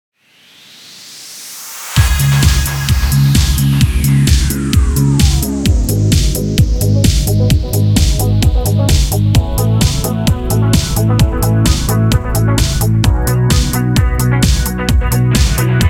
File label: Remix